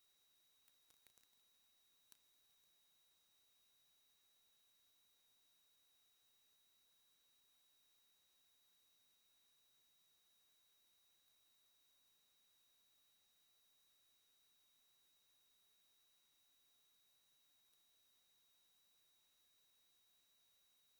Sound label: 60kHz